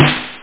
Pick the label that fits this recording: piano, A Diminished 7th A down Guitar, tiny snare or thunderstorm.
tiny snare